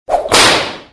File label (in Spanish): latigo latgazo; sheldon